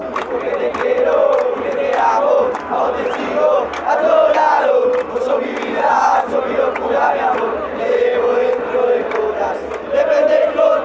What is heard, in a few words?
godoy cruz chant